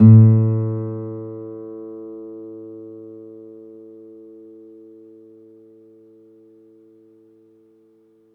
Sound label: Open, Classical Guitar